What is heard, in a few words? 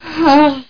pant; Battle of the Superstars